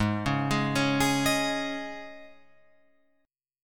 G sharp Suspended 4th, Guitar, Open F, G#sus4 chord; G#sus4 arpeggiated; JGuitar